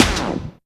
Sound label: EFEX, FX DRUM